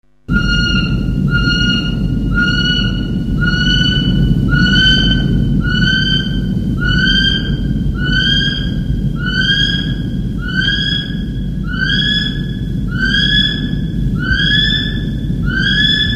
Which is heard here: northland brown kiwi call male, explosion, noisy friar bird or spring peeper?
northland brown kiwi call male